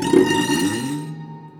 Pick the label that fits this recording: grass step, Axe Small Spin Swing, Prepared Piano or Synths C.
Prepared Piano